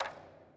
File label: orch percus